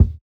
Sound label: Kick, jungle breaks drum sounds; ORIGINAL JUNGLE BREAKS